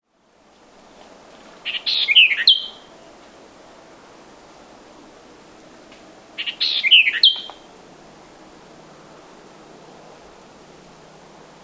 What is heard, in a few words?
white eye vireo